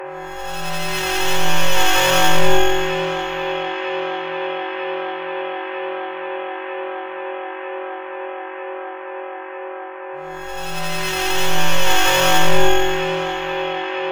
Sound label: synthy ambient